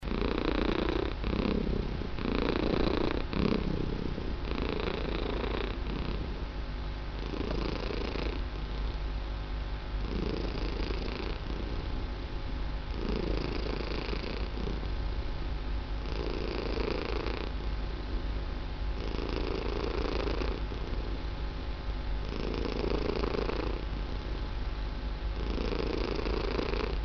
zvuk murlykan e koshki, zvuki murchaniya koshek

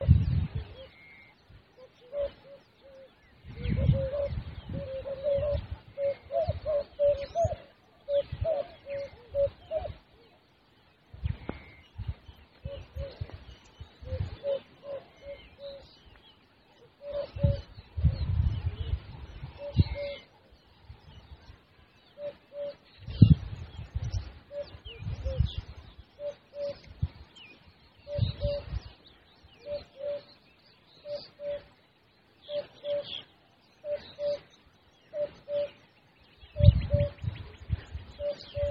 afrikanskaya kukushka cuculus gularis

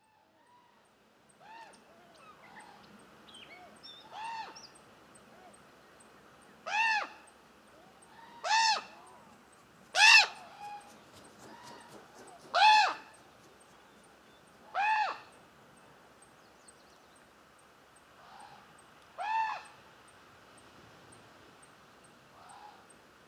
voron corvus corax

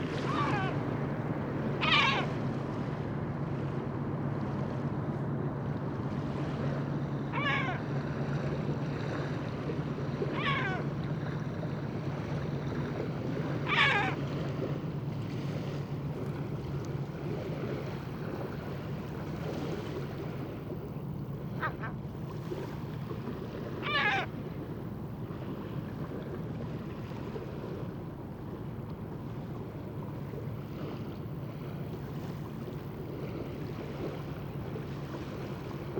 morskaya chayka larus marinus

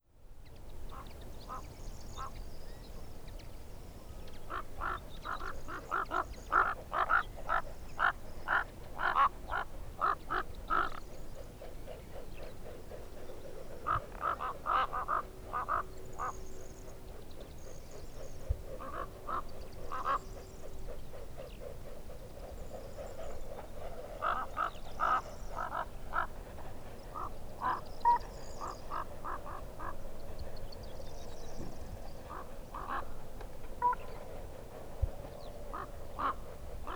voron corvus corax

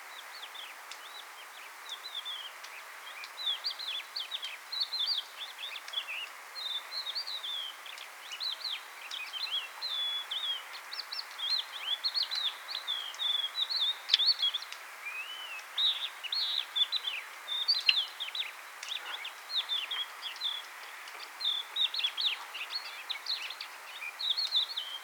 hohlatyy zhavoronok galerida cristata, zvuki peniya ptits

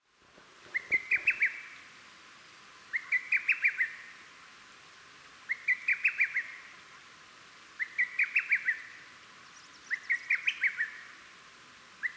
malaya kukushka cuculus poliocephalus, zvuki kukushki

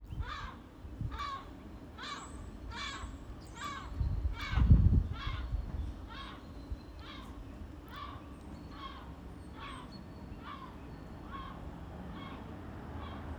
klusha larus fuscus, zvuki chaek

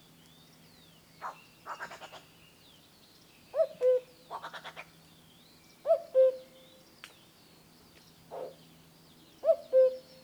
kukushka cuculus canorus, zvuki kukushki

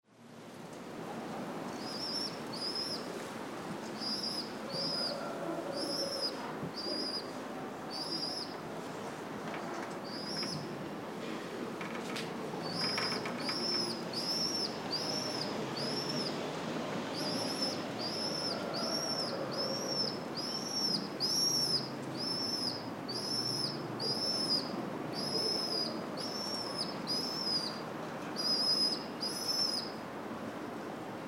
sizyy golub columba livia

